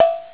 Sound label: bell; xfce